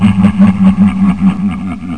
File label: lose